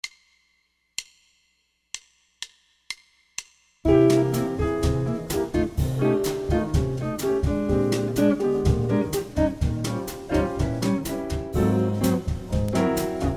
Cycle Latin 125; Cycle of Fourths Latin; Bass Practice Tracks

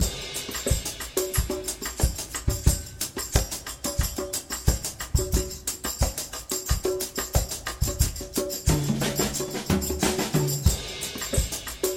Samba Loop 180

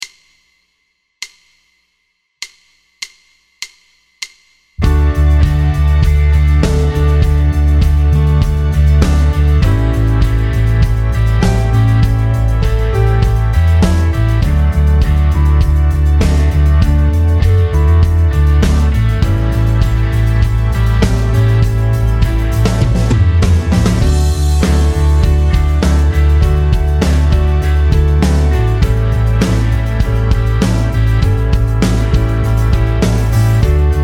One Five One D2 100 GTR

